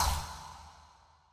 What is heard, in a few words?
double down, blackjack